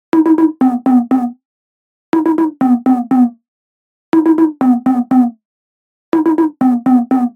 Serum LD

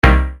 Stab classic

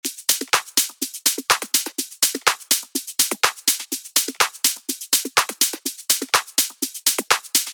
top loop Fm 122; Basic Wavez Dulus

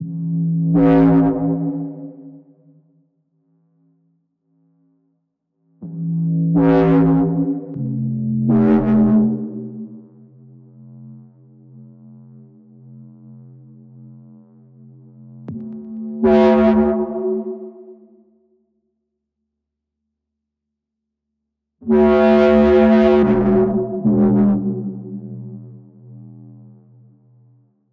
Serum Stab

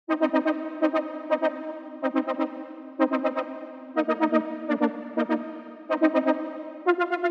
Serum LD LFO

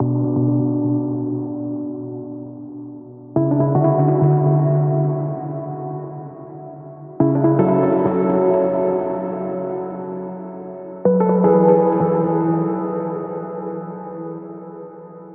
Serum Pluck Rhodes

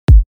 Kick 53Hz G; Belief

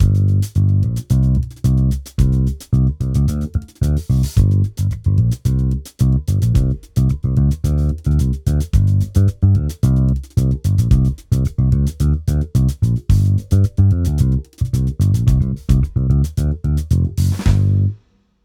Disco, Soul Mids; EZbass MIDI; Gospel EBX

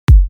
Kick 49Hz G; Green

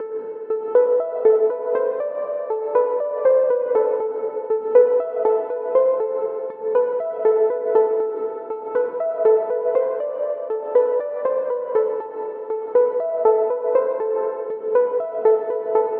Serum Arp

